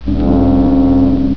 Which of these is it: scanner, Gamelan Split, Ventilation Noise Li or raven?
scanner